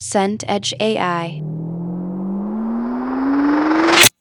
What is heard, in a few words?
powerup synth